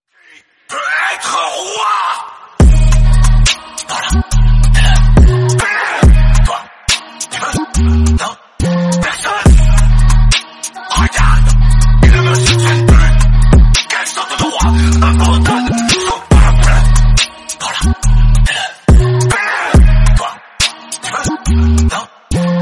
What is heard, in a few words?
drill remix bgm